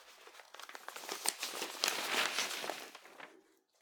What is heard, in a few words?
newspaper shuffle; Film Noir; SoundPad